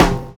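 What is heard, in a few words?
BROKEN SNARE; Univers Sons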